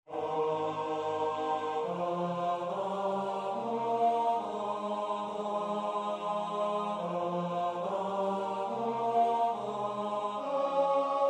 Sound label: Psalm tenor